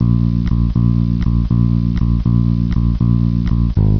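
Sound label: Groove 120 Bflat, Bass; Sonic Foundry (Sony Creative Software); Crimson Blue and Fabulous Horncraft 4 RnB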